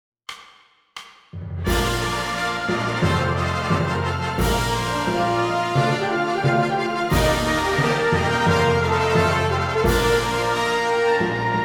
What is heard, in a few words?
Tempo 88bpm